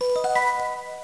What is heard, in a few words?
Ready Sound; PowerMenu 2.0